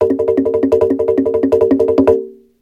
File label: Checkpoint